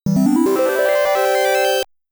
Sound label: Round Start Music, Again